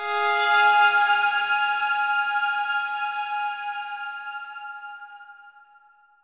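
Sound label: Synth F minor; Torchlight III; PS4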